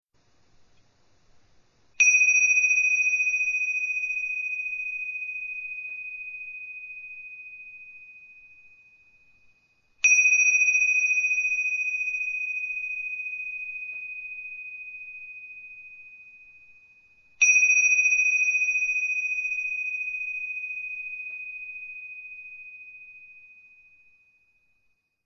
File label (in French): Méditation assise - cloches